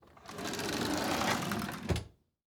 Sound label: Household Drawer Kitchen Silverware Drawer Close